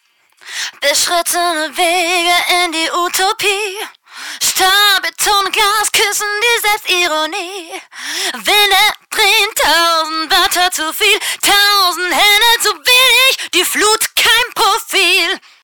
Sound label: neon vox, drive